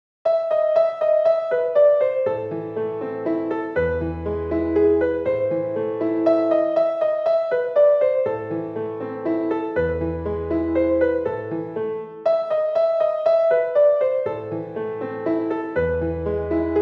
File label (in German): Klavierstuck in a-Moll; Fur Elise - WoO 59